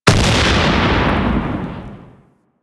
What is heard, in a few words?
Raptor Mortar Explosion; Warframe